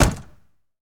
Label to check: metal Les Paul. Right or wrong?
Wrong